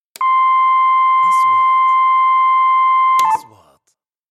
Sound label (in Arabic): نغمة كاشير, transitions